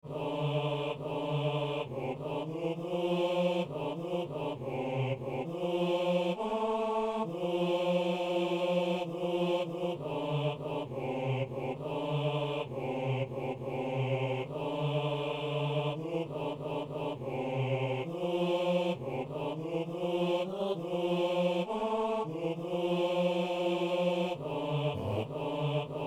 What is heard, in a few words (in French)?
basse; Messe coutrillonne - Sanctus